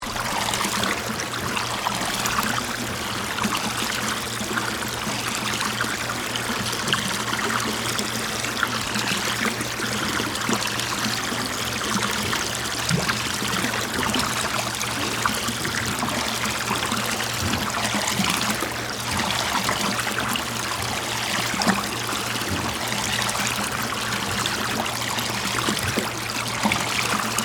Boat Outboard, Motors, Bilge, Pump, Release, Water; Transportation, Watercraft; Police, Marine, Division, Cherokee; BlastWave